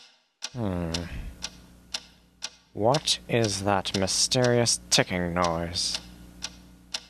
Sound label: mysterious ticking